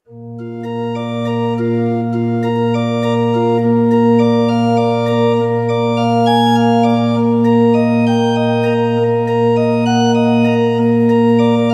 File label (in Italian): violino organo; ave maria